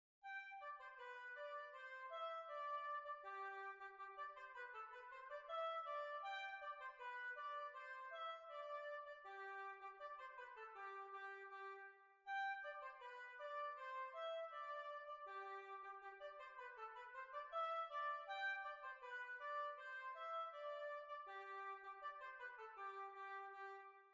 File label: Hornpipe Morpeth Rant